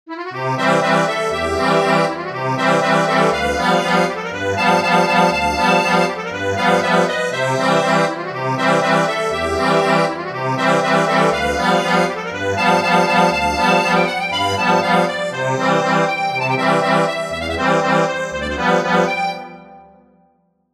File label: accordion, sheet music